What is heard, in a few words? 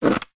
vehicles bicycle helmet pick up from ground; zapsplat